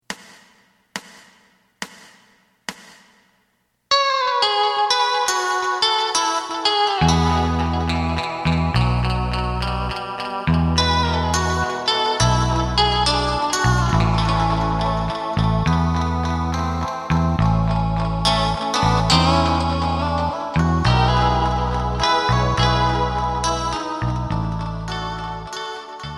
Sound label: drum